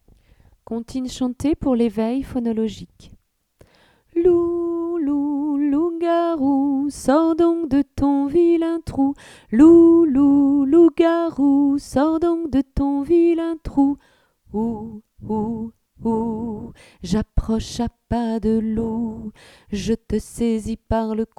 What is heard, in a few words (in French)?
Comptines Chantees; Loup; Comptines Chantees Pour L'eveil Phonologique